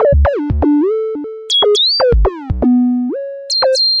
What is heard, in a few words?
120 analog bass n bloops, BASS LOOPS